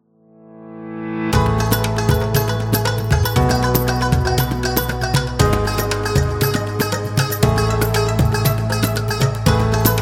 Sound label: India Music